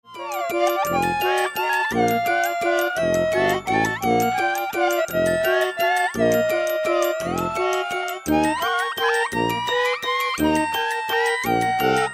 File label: music; Goat Simulator